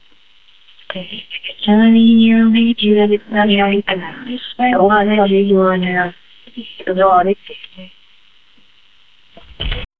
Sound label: a woman calling sounds li, apparition